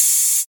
treble heavy trap open hat one shot a sharp key, open high-hat sample